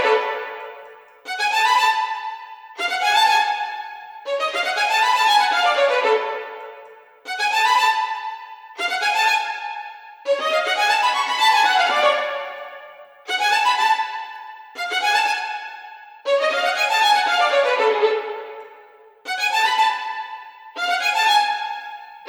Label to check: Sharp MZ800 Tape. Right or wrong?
Wrong